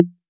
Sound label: 808 SD Orig, Snare Drum; Tape808; Goldbaby Tape808 & Tape909